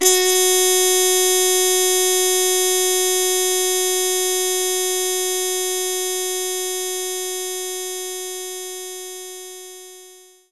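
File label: Muted Trumpet F#4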